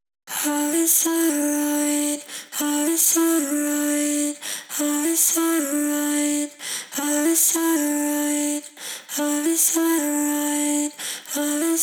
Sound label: house to myself vox